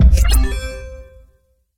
Achievement Sms

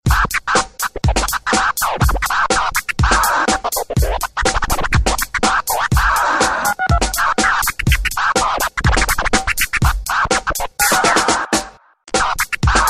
electro scratch; ringtones; sms

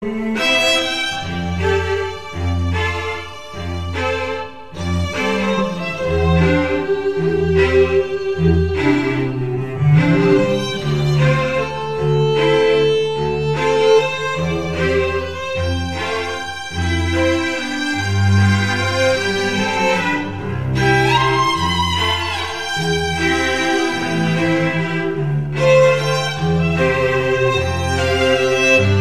sad violin GOONG

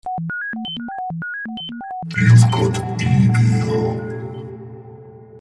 ROBOT E MAIL; Mflex

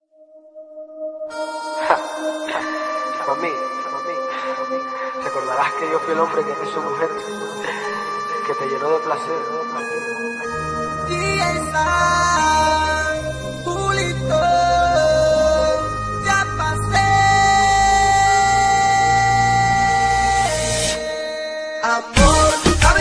reggaeton romantico, ringtones

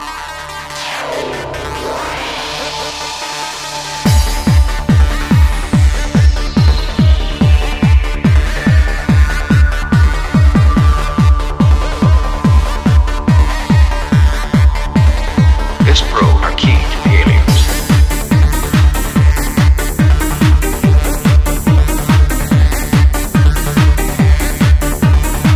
Techno

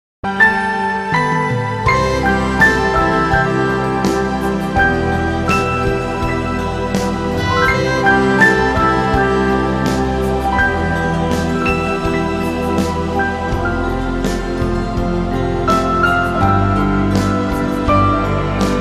romantic touch

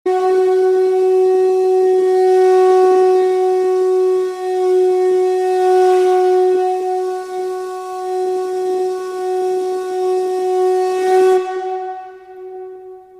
shankh tone, ringtones electronic

